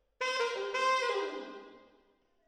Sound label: MKH AB, piano